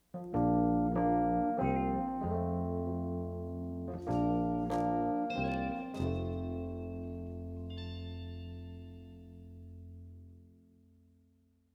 slow rhodes

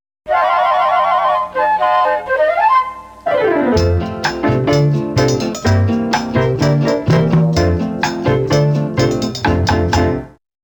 ROMANTIC COMEDY SPACE AGE POP COCKTAILS

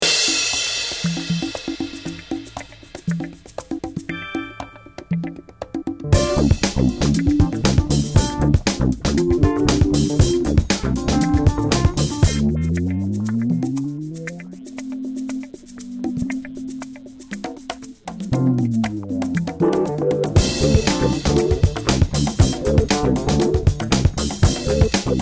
pop funky

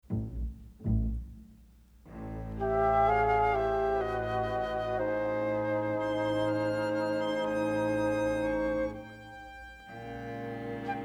orchestral

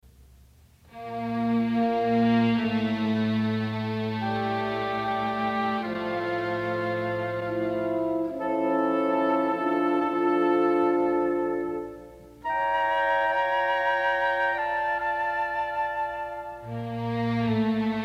orchestral

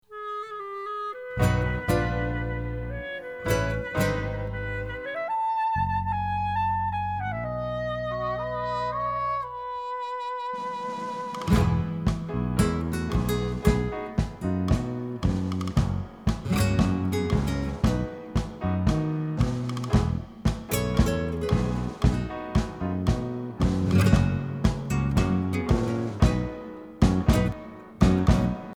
ROMANTIC COMEDY tango; real short

